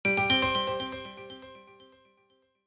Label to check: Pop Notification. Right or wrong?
Wrong